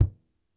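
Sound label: Large Frame Drum mute, World Percussion One Shots; Sony CS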